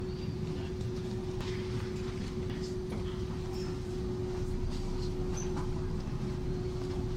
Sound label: ambiance, Metro